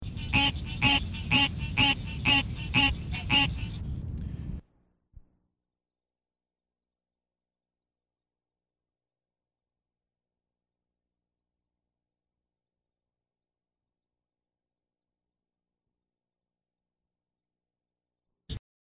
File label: Green Treefrog; wildlife